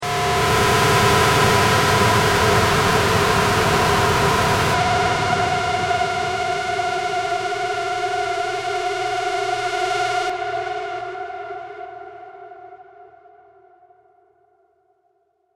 Distorted Metal, Harsh metallic Industrial screech to make your teeth rattle; Sound Design